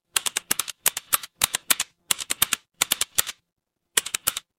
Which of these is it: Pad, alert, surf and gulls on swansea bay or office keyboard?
office keyboard